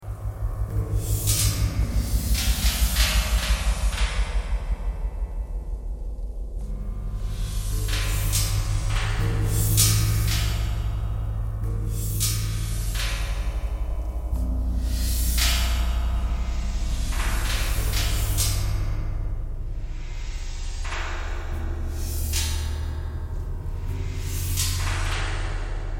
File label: knocking thread noise all